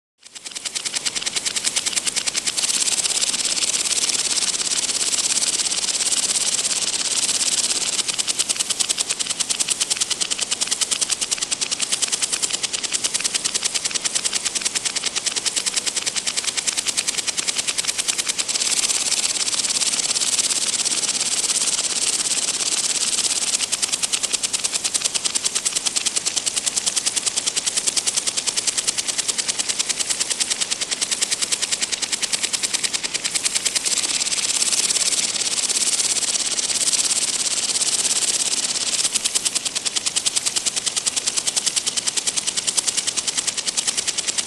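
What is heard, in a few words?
Sprinkler; Two Water Sprinklers.; Rainbird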